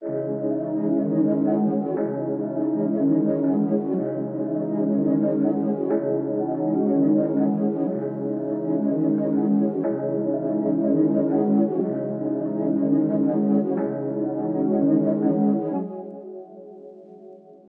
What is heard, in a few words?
122 BPM C# Min Low Melody, Loop Stems; Melodies; Cymatics; 2022 Melody Mystery Expansion; Chain